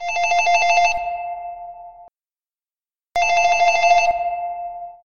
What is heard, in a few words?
iPhone SMS; iPhone 14